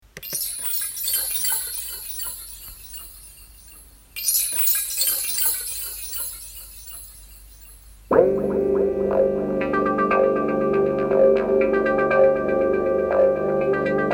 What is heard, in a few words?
voice memo beat